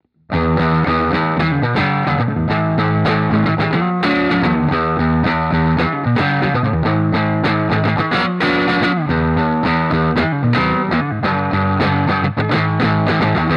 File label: crunch rock neck, guitare; très rock; indie totalnatural